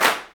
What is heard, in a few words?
CLAPS, Africa Handclaps